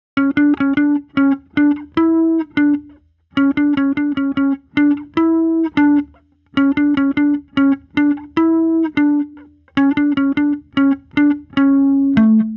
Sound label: bass